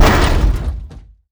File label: Touch In, Damage; DCS